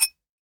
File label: Ceramic Glass Scratch; Sample Growing Stream 002; Ear Candy